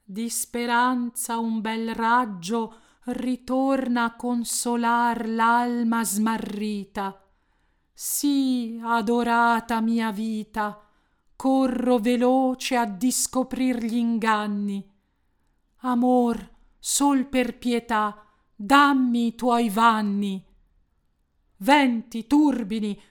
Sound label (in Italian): recitativo aria; Rinaldo